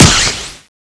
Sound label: wire shoot; zombie plague